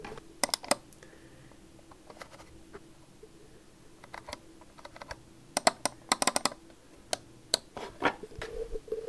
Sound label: Mouse Click